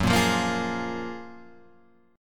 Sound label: F sharp Major 9th F sharp, Guitar Open G